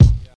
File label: old school phat hip hop kick single hit c key